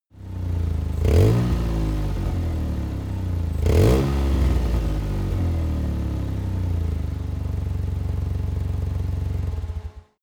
car buggy rev up small

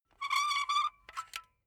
silicone gun empty pump slow; berufe; handwerker; soundpool

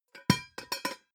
pot lid close plop short; objekte; topf

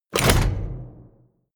sci-fi contact mine place

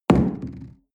coffee place tablecloth hard wobble

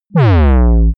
break down short; energie

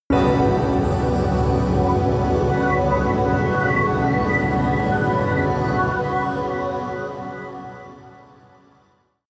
bling ring whistle pad; flaechen

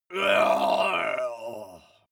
male groan painful

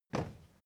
step crowd march quiet single left; schritte

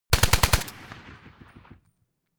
rifle ak-47 exterior fire burst

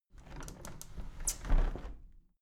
window handle plastic squeak close tilt soft; objekte; fenster

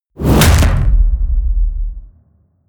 hit wood, hits impacts

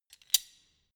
wrench slide open; schrauben drehen hydraulik

